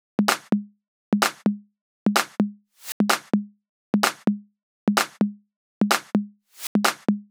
128BPM Drums; Vengeance Samples; Zeta Kit

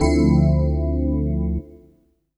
Synths C; Vengeance Samples

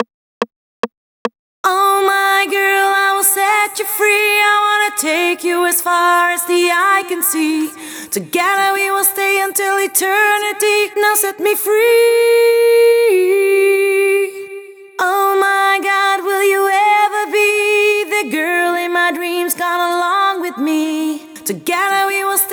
Chorus FX, 144 BPM; Vengeance Samples; Set Free

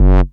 Bass Multi Rev F#; Vengeance Samples

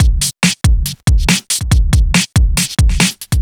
Breakbeat; Vengeance Samples; VEC2 Loops

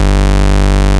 Arcade Riffs

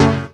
Synths F#; Vengeance Samples

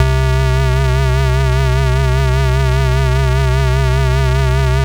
Basses C; Vengeance Samples

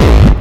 Bassdrums Hard Kicks; Vengeance Samples

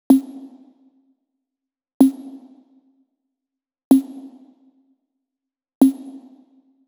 126BPM Cutted, TechHouse; Offshore; Vengeance Samples; Bonus TechHouse Kits

